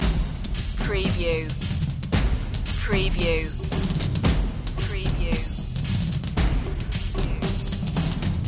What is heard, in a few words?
percussion loop